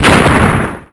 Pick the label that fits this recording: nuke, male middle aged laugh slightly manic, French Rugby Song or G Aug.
nuke